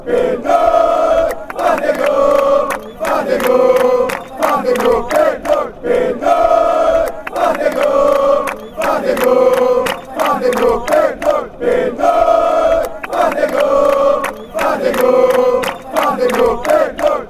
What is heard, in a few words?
fan chants